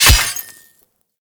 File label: Melee Axe Impact Robotic Flesh